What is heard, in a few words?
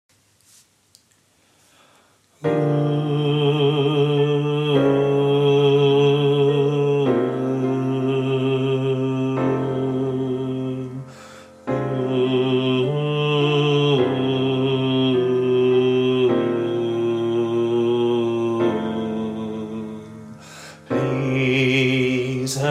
Alt; Popchor